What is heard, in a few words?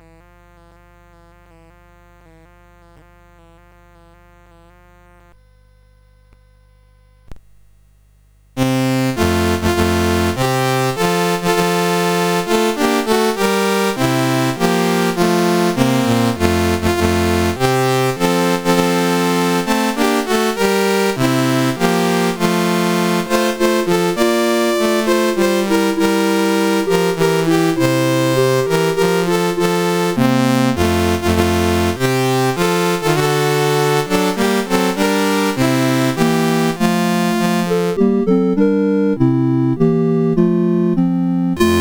O Tannenbaum, CSG8580R5